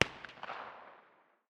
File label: smg 9 far distance; tacz-tweak-pack; taczmechanics